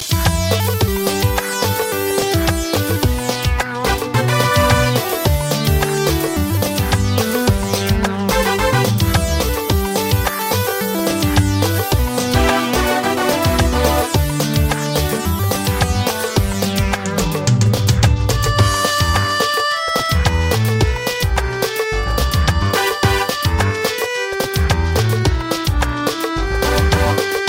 sweet music

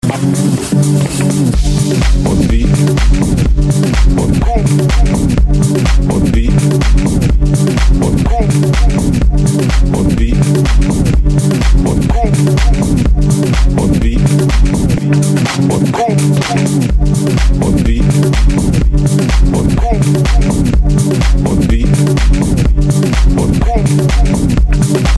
Deep house ringtone

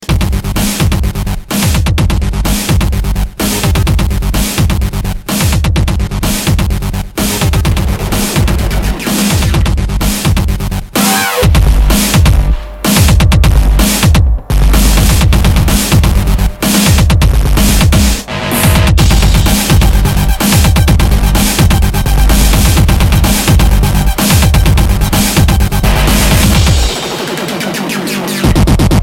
Bass

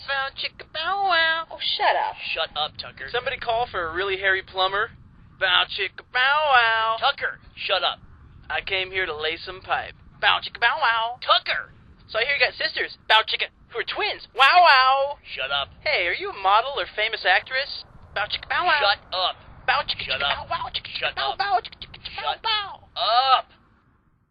Rock

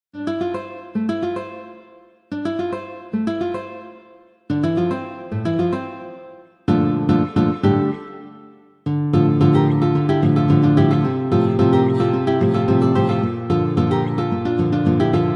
spanish guitar mix

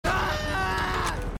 AHHHHEEEAAHHH; gta v

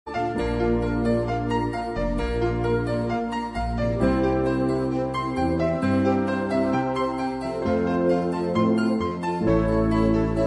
Calm Music

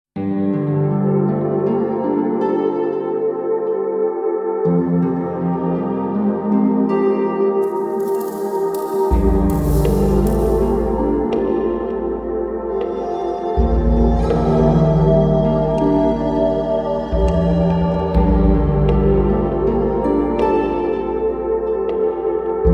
saving room

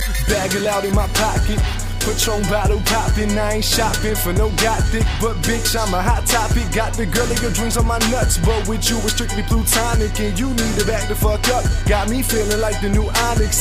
remix, hip hop ringtone

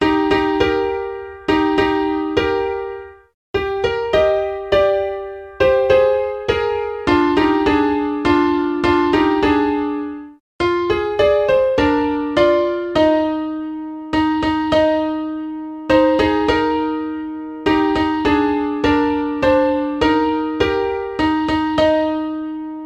Lullaby Piano

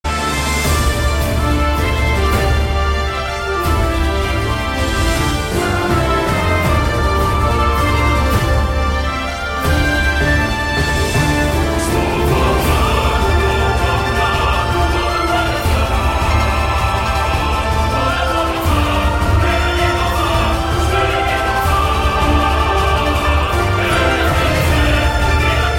dungeon boss

